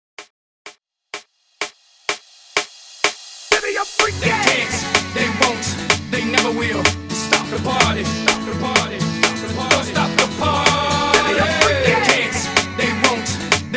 Clapapella Hype 126 BPM

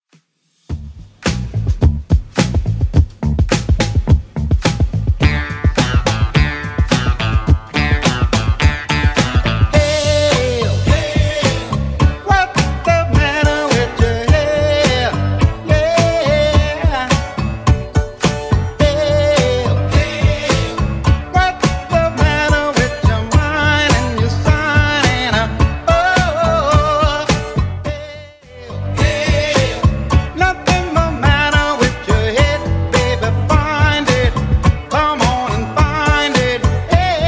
70s Redrum 105bpm

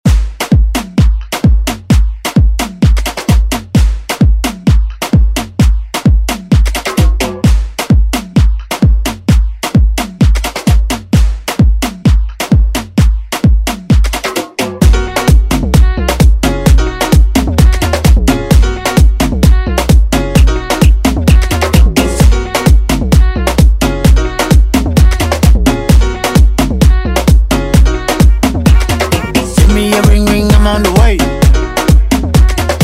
Afrobeat Redrum

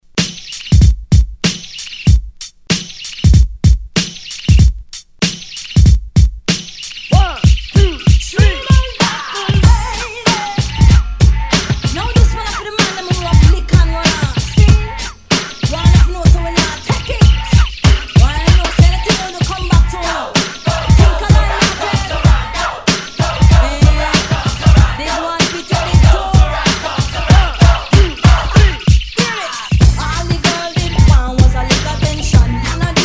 90s 93; DJDOLLS; ReDrum

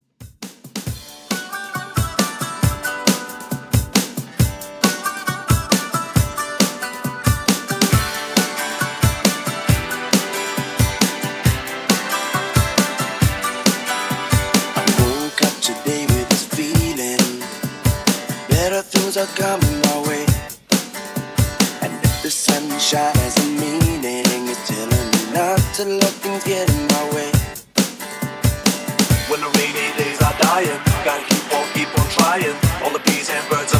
90s Boy Band Re Drum, 136 BPM

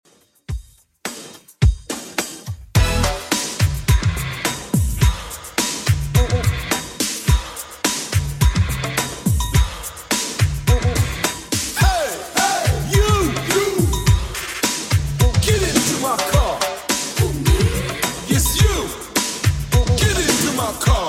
80s Redrum 106bpm

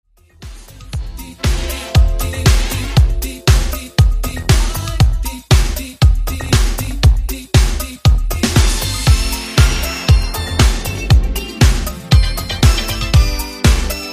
80s Redrum 118 Bpm

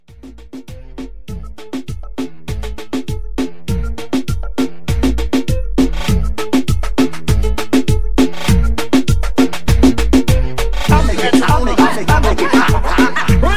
Party Break In Out 100

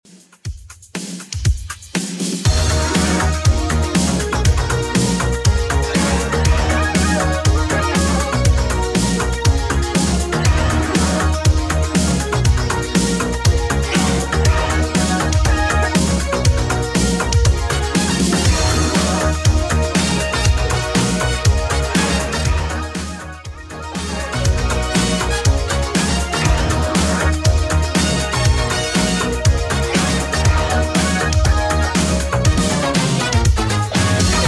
80s Redrum 120bpm

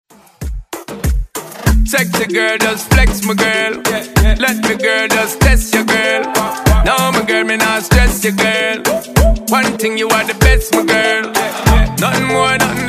Moombahton Redrum

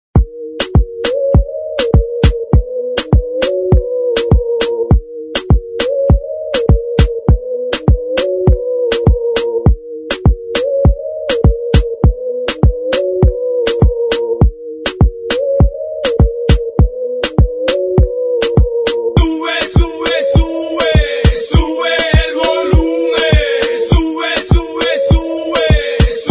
Hype intro

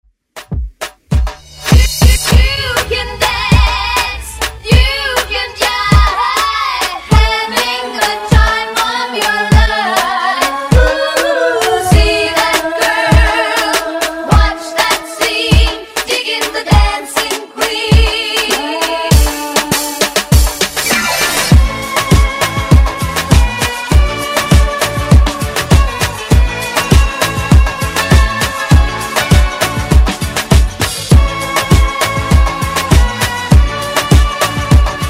Afro Epic Intro Redrum

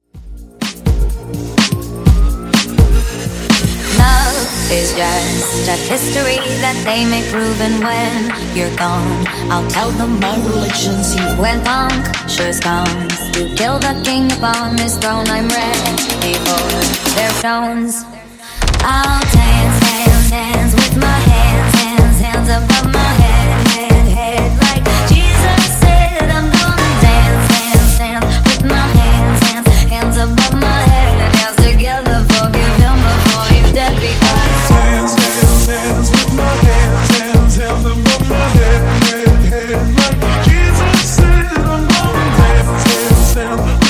Funk Booty Moombah House Clean 125 Bpm

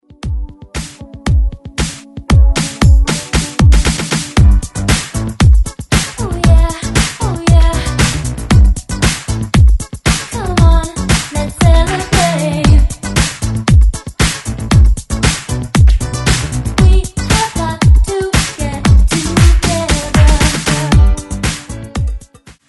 80s redrums

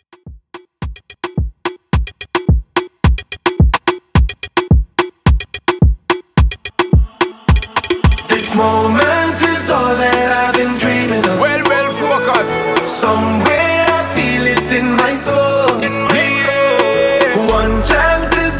Dancehall Afro 108 Bpm

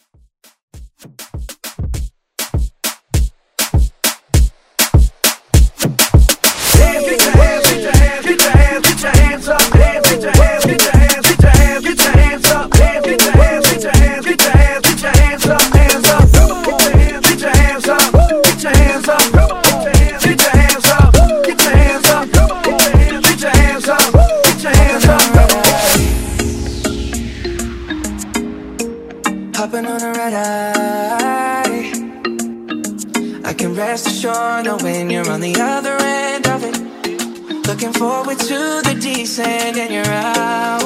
Moombah Hype Redrum

